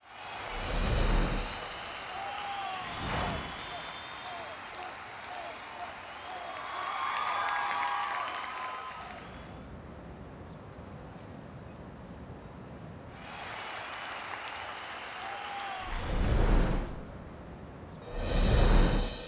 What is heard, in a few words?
SFX; Las Vegas